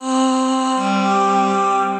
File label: Choral Voices; Infinity Caves; Ableton; Factory Packs; Voice Box; Chromatic Voices